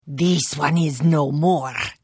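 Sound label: Attack, Khajiit female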